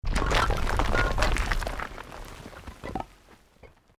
ROCK DIRT SLIDE SMALL

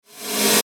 Metallic Static Crescendo; Production Element Imaging Element Accent Transition; Metallic Sound; Blastwave

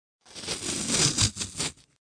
Tape Rip Long, Sticky office tape rip!; Offices

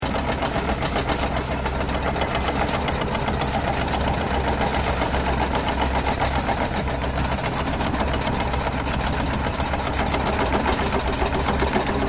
Tractor Earthmover Small Moving Loop; Tractors; Vehicles

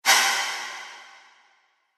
Flashback Transition Whoosh

